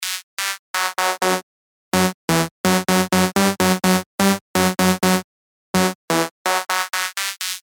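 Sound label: LD Detuned, lead; revealed serum 2 bass tech house vol 1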